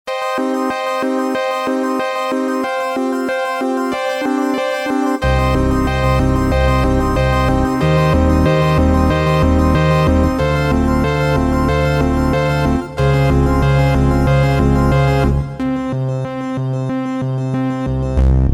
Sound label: Casio CTK-601, Tones, Squence